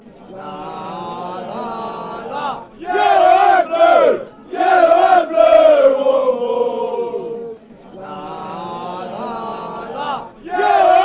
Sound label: fan chants; Lots of 'ders'